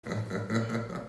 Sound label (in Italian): risata